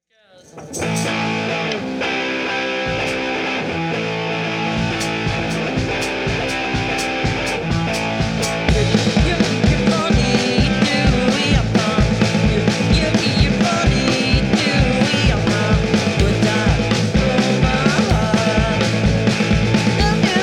Indian Song

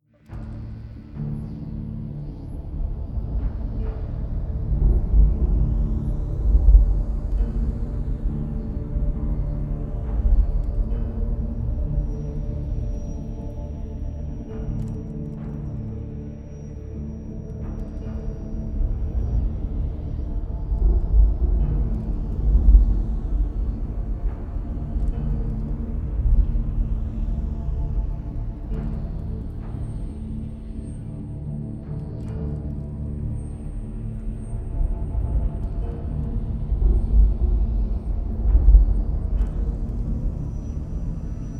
storm bed

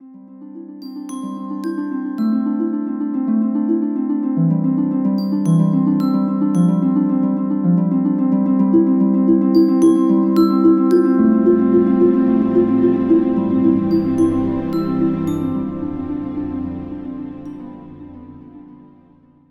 Ballet (cute and orchestra)

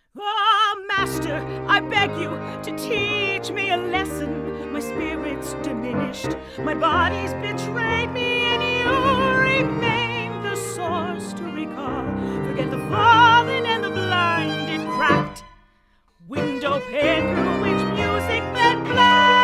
newcomers lament tuned w harmony

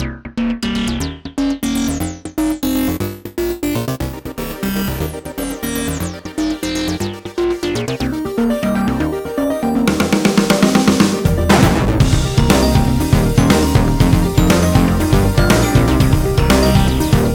Synth Jam

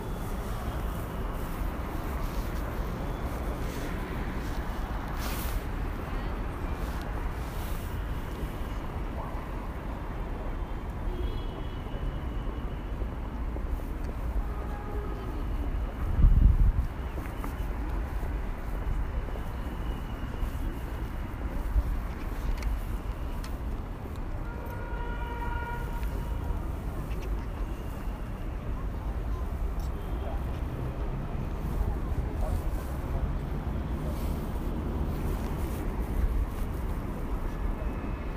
Chenggis Khan Square Ulaanbaatar; Mongolia 2016

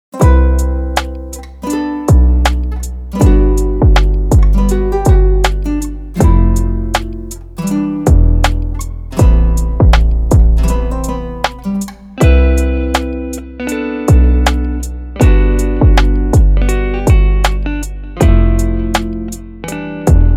Beat

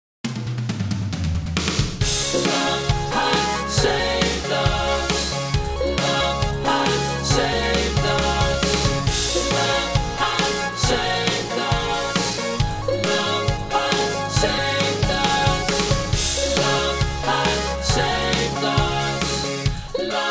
Vocal Recording

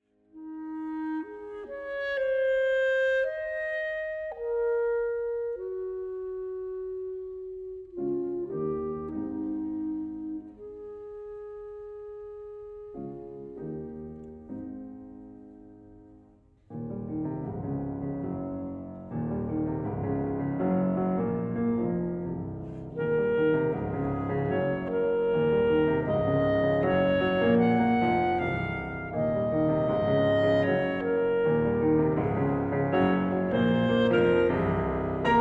Clarinet Sonata I. Andante semplice; Copland

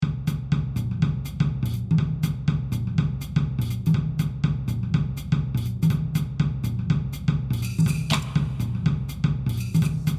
Shuffle

